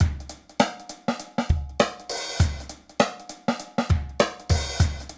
100 EASY, LOOPS; Best Service; Hyper Dance

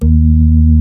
c3-f#3, Organ; BeeThree

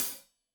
Hat Loose, Hi-Hat, Cymbals Stereo; Deeply Sampled Drums

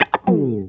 CHUCKA RUN, Funk Gtr & Wah; PlatinumPhattCD2; E-MU Producer Series Vol. 8 – Platinum Phatt

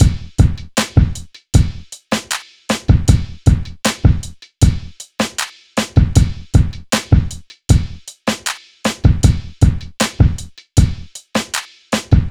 Drums, Hip Hop

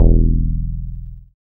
BAS MKS-50, Roland

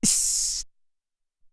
Shhhh

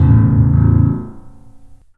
LOHARM, The Piano; InVision Interactive

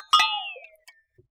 glock fx; Univers Sons - Raricussions

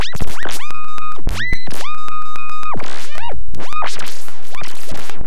loop, techno atmos

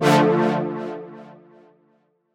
Dm, Chords; M8; Electronisounds

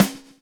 123 groove; AMG Gota Yashiki Groove Activator

